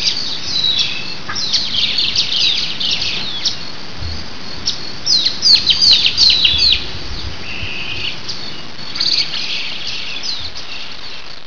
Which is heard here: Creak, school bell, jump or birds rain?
birds rain